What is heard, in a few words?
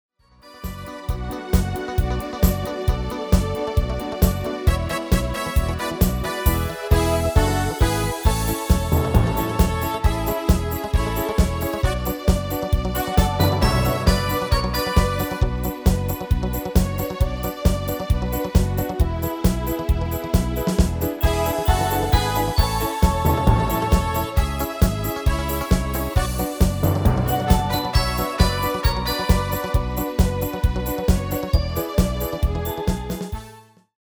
MIDI